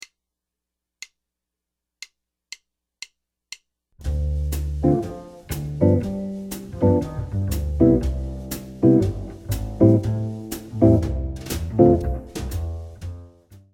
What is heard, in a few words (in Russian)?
Dzhazovaya posledovatelnost akkordov